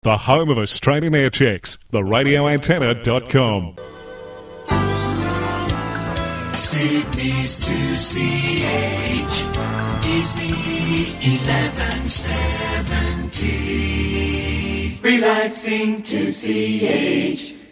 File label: Classic jingles; 2CH; 80th anniversary